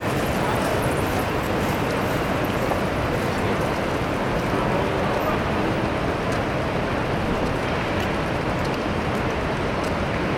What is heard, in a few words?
ambience urban city nyc broad street; sound effects one